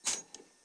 Wet Wipe, CLASS 47, Train; OpenBVE

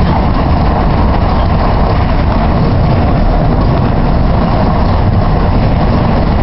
Run, Train; OpenBVE

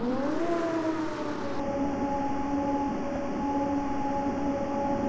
Traction blower restart - Tunnel, Train; OpenBVE; Cl313 Silverlink

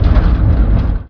Door Open, Train; GP38

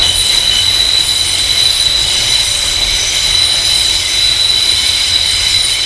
flange, Train; R142; OpenBVE; Jerome Yard

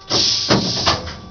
reverser, Train; V63; OpenBVE

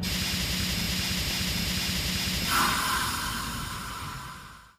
air, Train, R62 Kawasaki; OpenBVE

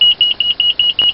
KVB bip bip, TGV Atlantique; OpenBVE; Train

